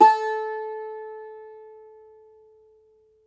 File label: Cumbus SAZ A, Plucked Strings; E-MU Producer Series Vol. 7 – Old World Instruments